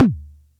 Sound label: Percussion Computer Tom; Atlantex MPC-1